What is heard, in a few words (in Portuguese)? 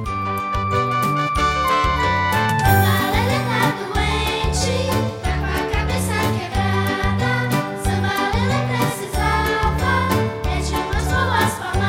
Samba Lele